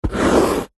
office pen draw line short slow on card back of paper pad; zapsplat